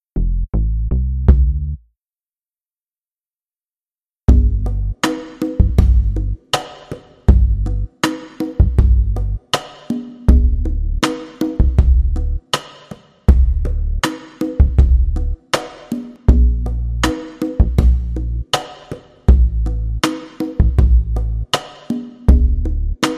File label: DnB